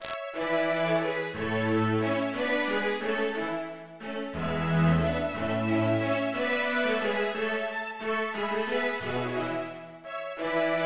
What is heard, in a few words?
Haydn String Quartet in A major